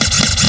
scratch

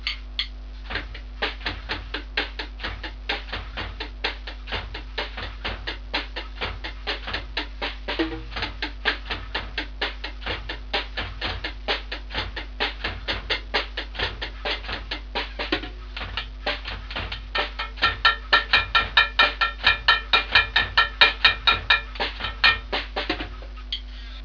drum; FLEX-J Webmasterによる速成教材パターンによる、消音パッド付ドラムのエイト・ビート実演奏サウンド; WAVファイルで聴くことのできる、FLEX-J Webmasterによるドラム・セットの練習演奏。

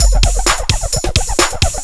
Cosmic

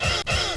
scratch

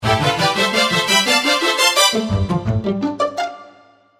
jingle

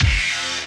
hit

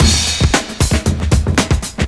kick beats

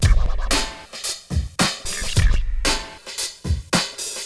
hiphop

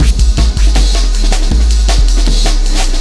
breakbeats

